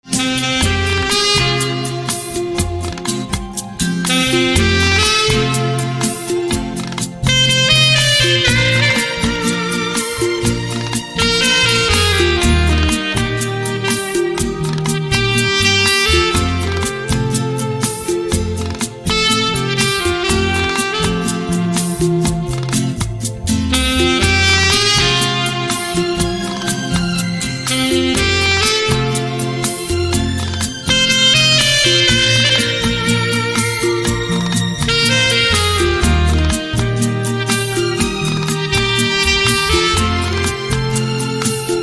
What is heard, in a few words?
Saxophone